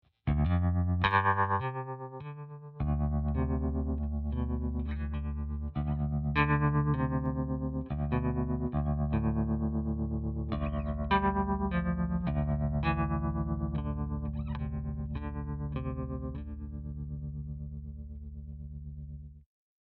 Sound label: bass; pod xt; twin peaks